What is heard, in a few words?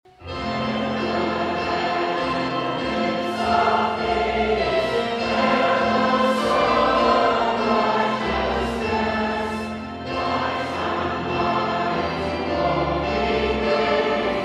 Hark the Herald Descant, choir; Hark the Herald Angels – Descant